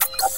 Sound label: FAST KODAK; sloodle; gaming